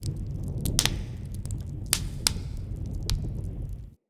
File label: Smithy Forge Fire Crackle; Soul Plane